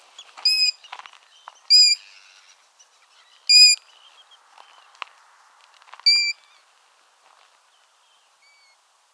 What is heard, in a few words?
Call of Golden Plover